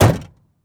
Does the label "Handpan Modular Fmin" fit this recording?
No